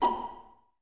Violas A#5 Pizz; Miroslav Vitous; String Ensembles

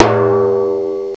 TIMBALE; CMI Sound Library Rev 1.3

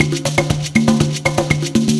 120 PERC

